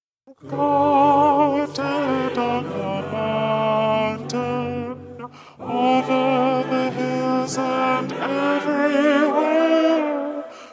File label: go tell it tenor